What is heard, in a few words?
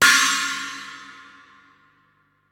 cymbal sample f sharp key